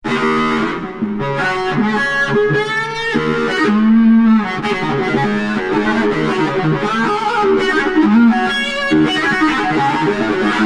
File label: E Guitar